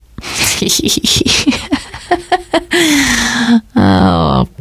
laughter, teengirl; source engine; left 4 dead 2

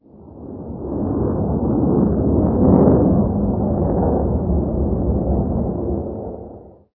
plane, ambient overhead

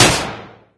sentry upgrading, weapons; tf

